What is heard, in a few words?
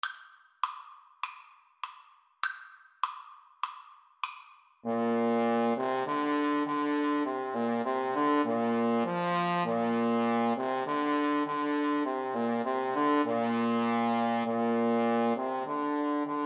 alouette duet, Trombone Duet